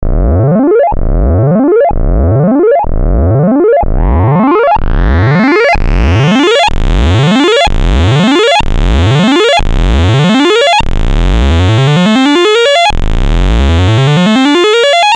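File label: quant with moog filter; Formant-LFO-->wavetable-->SAS-VCO-->Moogfilter-->Bergfotronphaser(static setting). The Wavetable is used as a quantizer; Soundfiles from the modular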